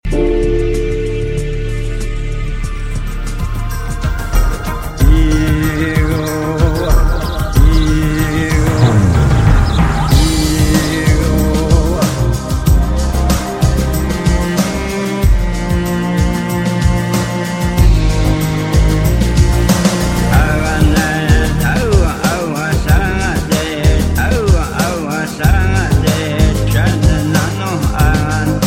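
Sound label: indejskaya muzika, Indian music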